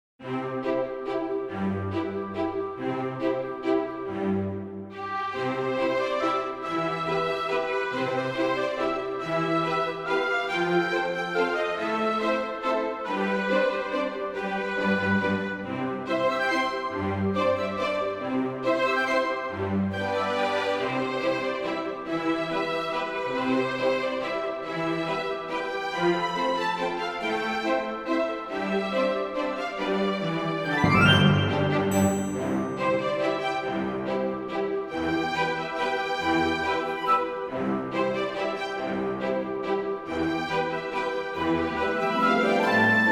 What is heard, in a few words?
Midnight Waltz